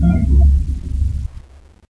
sawrunner pain

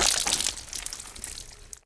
pain head, player pain

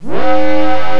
alert

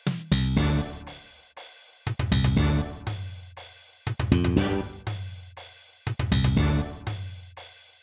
midi loop

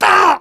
pain sharp; tf2; demoman

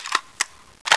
m2 assault rifle reloading

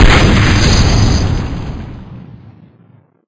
flare gun fire

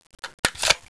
m4 m203 open

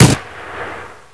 gun

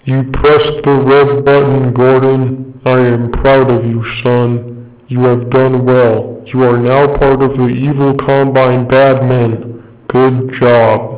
red button

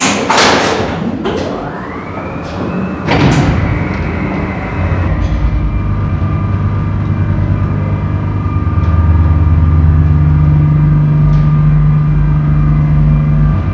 generator

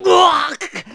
pain; piccolo

